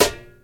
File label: short snare drum G sharp key, snare drum sample tuned to the G# note; snare drum one shots